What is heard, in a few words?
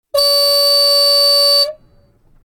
dj horn